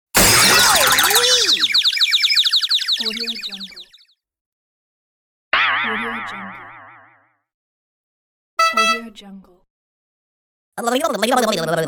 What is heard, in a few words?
cartoon funny bloopers pack; applehillstudios